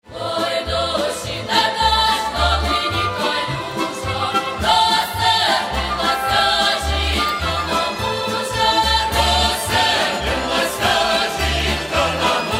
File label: Folk Choir; Cherkasy